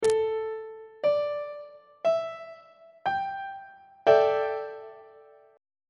g double sharp dominant 7th suspended 4th chord on treble clef